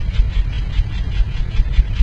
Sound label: Hall Fan